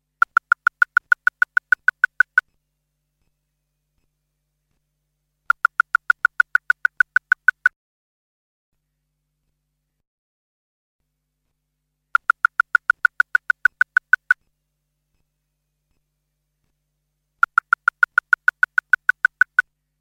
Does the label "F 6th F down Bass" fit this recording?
No